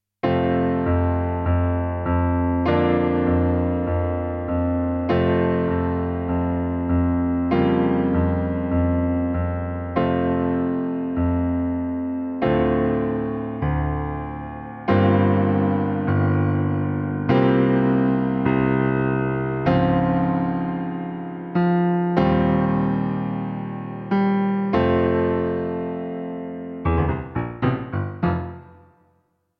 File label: karaoke